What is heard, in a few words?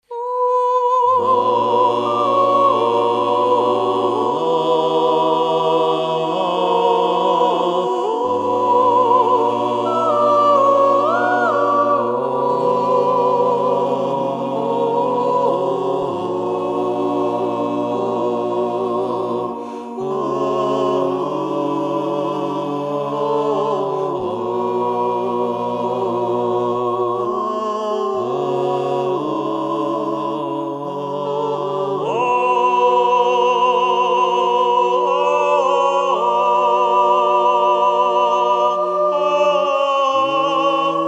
choral